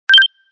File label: ringtone